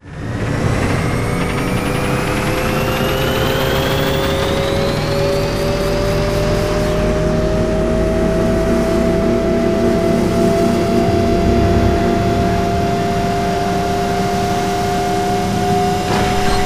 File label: dish power up; Titanfall 2